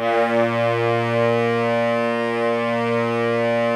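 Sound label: TENOR SAX, Sax section; Giga Samples Collection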